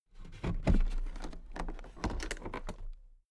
toolbox open soft

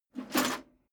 metal plate armor movement single

